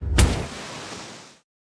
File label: Water Punch